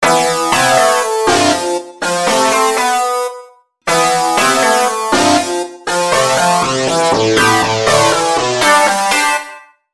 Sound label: depressing rock music